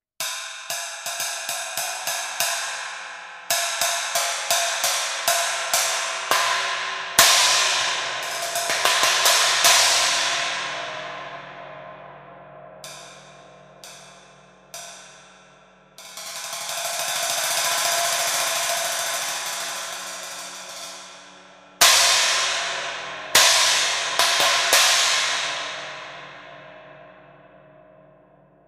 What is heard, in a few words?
Voodoo 27 Inch China Ride